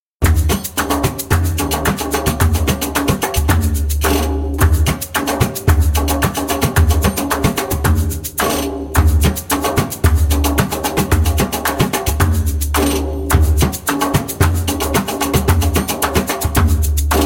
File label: Suppaa Maqsum Short fast spoken, Djembolale; Djembolale - Grooves and Moves